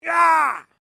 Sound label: MALE SCREAM MID; Male Vocal sounds; Screaming (Sound Effects:Voice)